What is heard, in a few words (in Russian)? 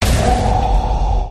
udar